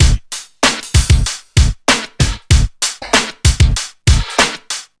96 bpm breakbeat sample G sharp key, kick tuned to the G# note; drum loops